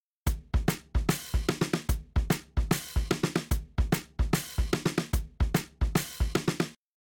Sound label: Drums Shuffle SN HH; WDR2